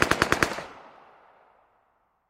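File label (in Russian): глухая пулеметная очередь вдаль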